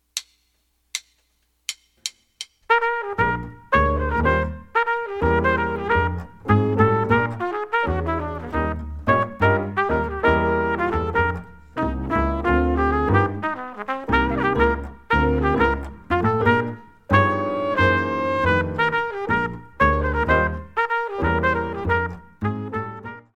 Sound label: minus Drums; Medium; count off sets up the melody